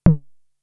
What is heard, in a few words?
Tom, Toms; Fricke Schlagzwerg; Drum Machines